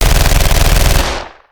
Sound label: Gun, Machine, M3, Long Burst; gun and weapon sounds; captain audio; fully loaded